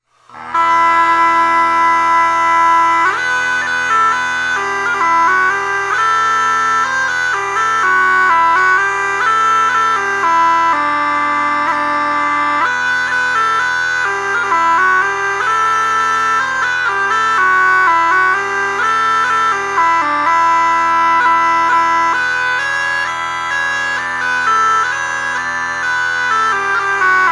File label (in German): tief De e-moll, dudelsack